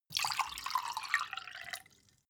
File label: glass pour ring; berufe; gastronomie; soundpool